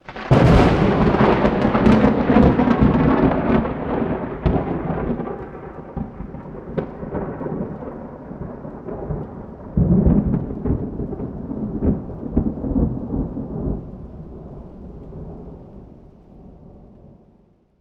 lightning strike

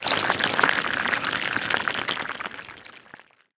melt with acidic foam

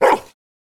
big dog barking

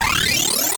status boost

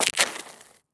stepping onto road

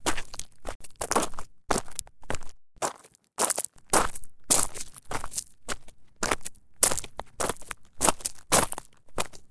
walking on gravel

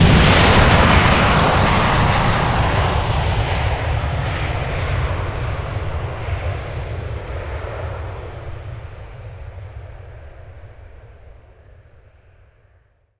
space fighter taking off

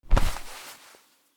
embrace

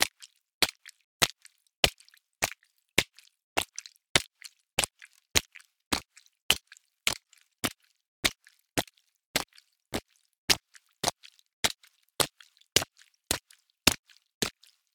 walking on rainy road

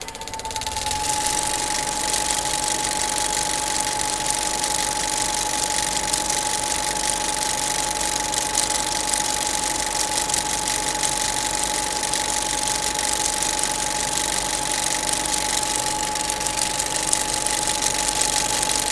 35mm film projector starting up

